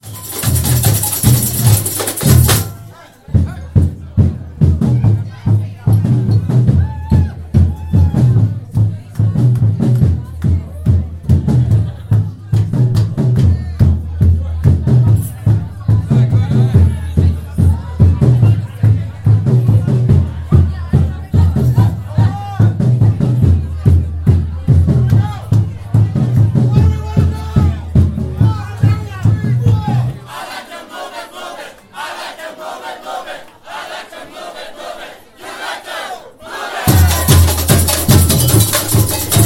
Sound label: Samba break, rhythms, bateria; Move it break